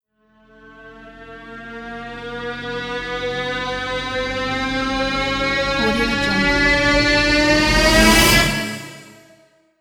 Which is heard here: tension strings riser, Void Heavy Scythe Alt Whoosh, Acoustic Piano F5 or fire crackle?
tension strings riser